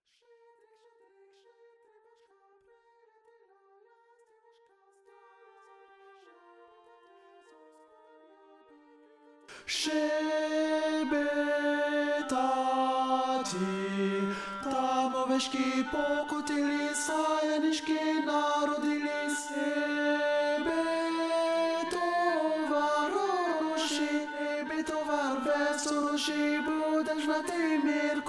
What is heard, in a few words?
tenor; shchedryk